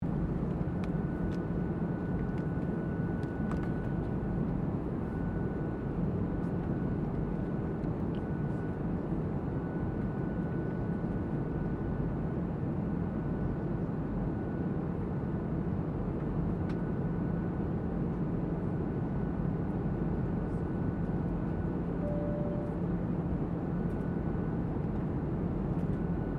AIRCRAFT BOEING 737-700 AMBIENCE INTERIOR STEREO, passenger plane; 737-700 passenger plane samples; Airplanes